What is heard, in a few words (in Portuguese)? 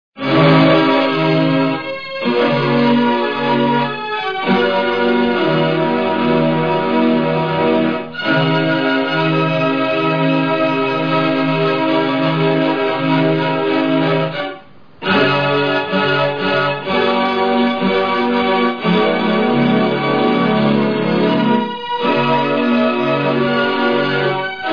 orgao